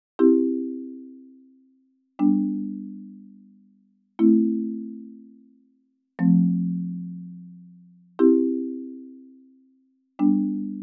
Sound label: Marimba